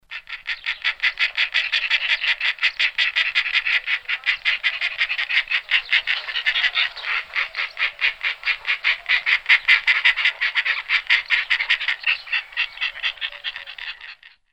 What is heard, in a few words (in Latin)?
Neophron percnopterus